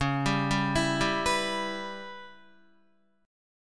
C sharp Minor 7th Flat 5th C sharp Guitar; C#m7b5 arpeggiated; 8,10,7,9,7,7; JGuitar

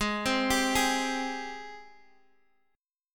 G sharp Major 7th, Guitar, Drop D; x,11,10,12,9,x

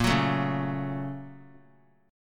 A sharp Minor Major 7th Sharp 5th A sharp; A#mM7#5 strummed; JGuitar

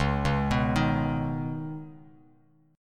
C sharp 7th Suspended 2nd, Bass Standard; C#7sus2; arpeggiated; JGuitar

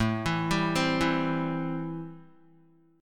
A-Minor 7th A Guitar; 4,7,7,5,4,x; Am7 arpeggiated; JGuitar

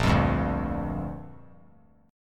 A sharp 6th; strummed; JGuitar

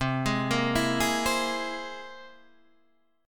C Augmented 7th, Guitar Half Step Down, chord

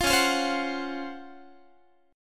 Db Major 11th, Guitar; strummed

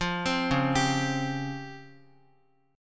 C sharp Major Sharp 11th, Guitar; C#M#11 arpeggiated; x,x,5,5,1,3; JGuitar

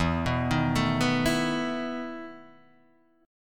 E Major 7th Suspended 4th; chord shape

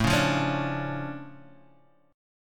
D Minor Major 7th, A, guitar

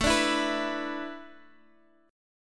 E 7th Suspended 4th A, guitar

